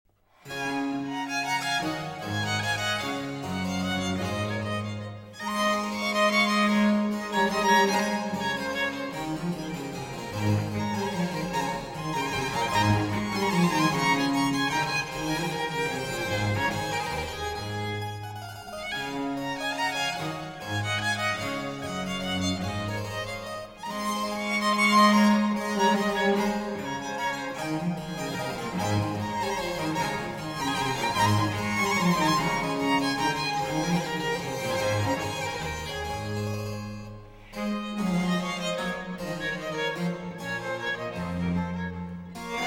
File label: Sonata D Major For Two Violins & B.C. Menuet (Allegro)